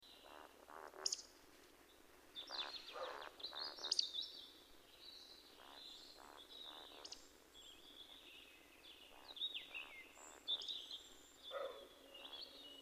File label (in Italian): Beccaccia